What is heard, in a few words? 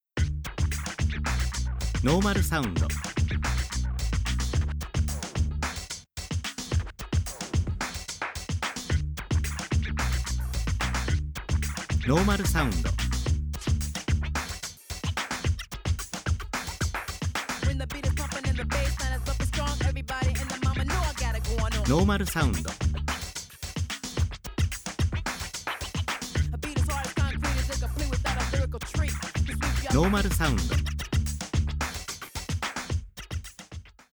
hiphop